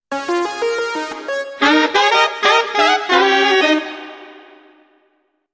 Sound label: Sassy Saxophone Sting